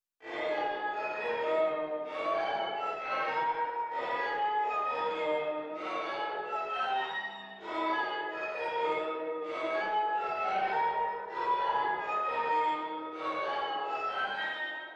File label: 130 BPM G# Min Bells Warped; Melody; Loop Stems; 2022 Melody Collection Platinum Expansion - Pt 1; Cymatics; Louis Vuitton